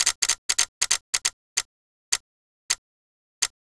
Turntable

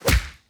Mage Attack hit

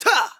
Role Fighting Shout

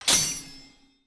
Unlock Treasure

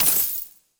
Money Expend

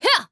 Mage Fighting Shout

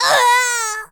BOSS Woman Death

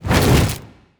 BOSS Atk

